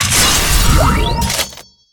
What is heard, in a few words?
armor wear; firefight